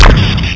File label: arm Phazer; PC Gamer IT CD 48; Big bang